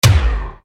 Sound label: BAZOOCA KICK, Yamaha RY-30